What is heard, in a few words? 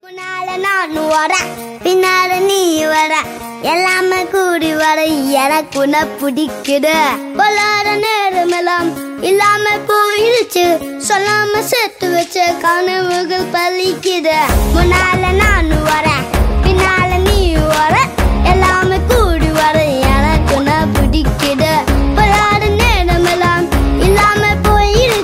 ringtone